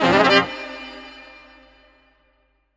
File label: SAX, 150 BPM; Ueberschall; Drum & Bass Resonance